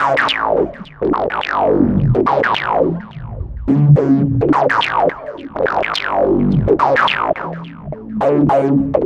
Filtered Funky Bass, Electric Bass

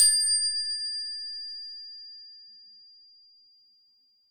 TEMP A7, temple bells; SoundBytes - Early Patches

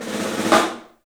STEEL ROLLS; 3D Drums

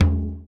surdo; ILIO; Ethno Techno

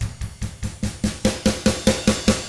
ROCK 6-8, Drums; ILIO; Double Platinum Drums 1

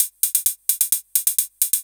drum 130 hihat, Techno Trance, Drumloops; Techno Trance Essentials

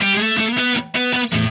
Happy Pub Guitar; Sonic Foundry (Sony Creative Software); Indie Rock Anthems; Session 05 - Happy Pub

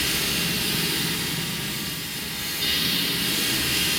sparse soundscape samples; ReLooped

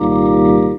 talk box chord ee

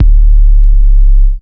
kick, Big Beat Samples; Big Beat Samples & More; AKAI

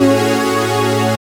SYN PAD, dance synth; Sound & Vision - Gigapack I CD 2 (Roland)

